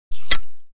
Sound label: grenade pull; AssaultCube; weapon